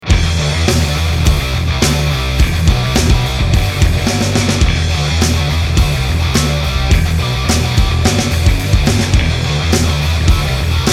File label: metal mixed, gtar